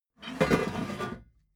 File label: metal scrape wood soft